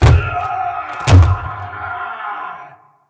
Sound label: Castle Siege Roof Boss Bang Door C; Encounters